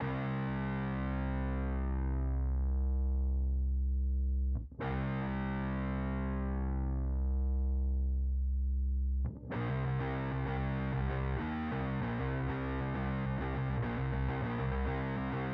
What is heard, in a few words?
music interactive; World of Tanks